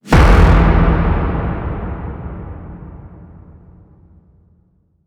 Impact E; FX; 99Sounds; Monosounds

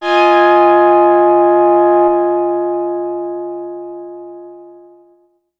diggin, excessive stereo; E-MU Producer Series Vol. 6 – Analogia Project; Strictly Synthe

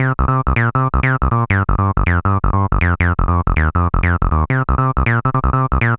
Acid Loops, 160; Dance Synths; Hardcore Loops

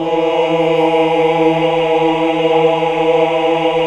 AAH, Hallelujah

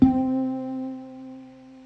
SYNTH Harp

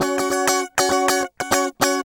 GUI 100 BPM, Roland

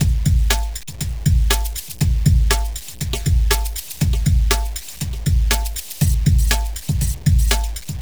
subspace backbeat, hyperspace pulse grooves

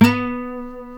B NYLON, Guitars

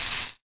Frying Pan; Hits

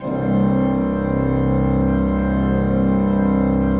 PLENO C1, Cathedral Organ; ILIO; Propeller Islands

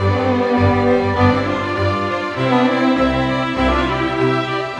Classical Strings, Loop Samples, Orchestral; Sonic Foundry (Sony Creative Software) - Crimson Blue and Fabulous Horncraft 4 RnB

